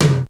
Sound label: HITOM